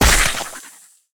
NPC Killed

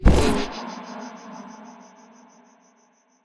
upgrade

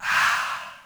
NPC Killed

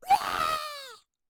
kobold flyer charge scream

